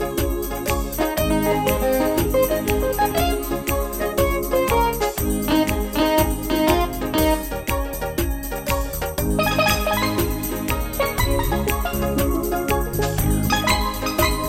Love Reggae

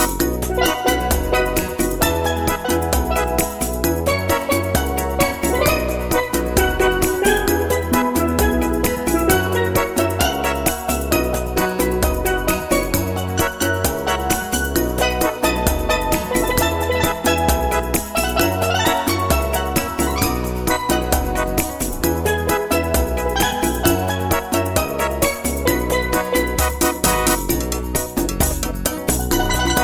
Rock Cha Cha

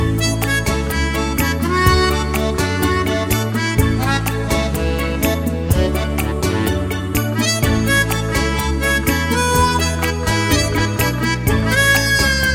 Calypso

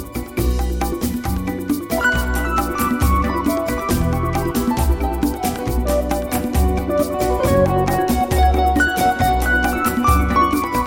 Natural Bossa Nova

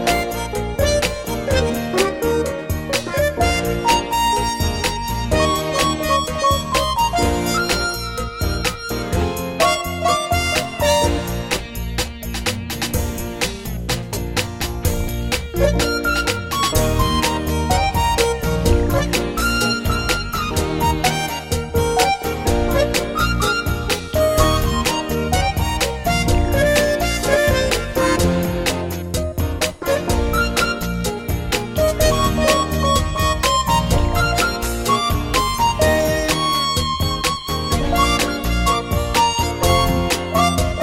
Rolling Blues

